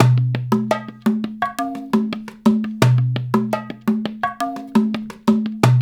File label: percussion loop; InVision Interactive; Percussion Slam; WORLD